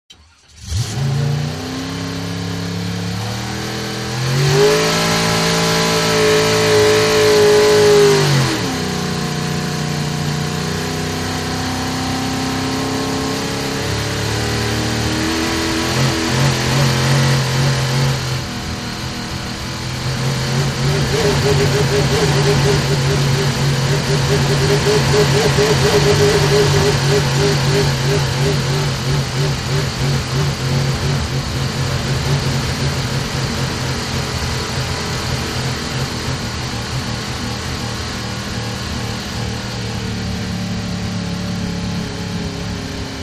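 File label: Inboard Outboard Boat Interior, motorboats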